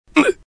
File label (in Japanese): dame jaku, ダメージ弱, 穏やか紳士タイプ01; 敬語キャラ、ジェントルメーン。; 低音でやってみました; ゲーム用フリー音声素材集